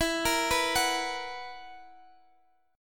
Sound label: B Major 7th Suspended 4th, guitar chord